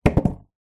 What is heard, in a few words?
sport skateboard set down on wheels; zapsplat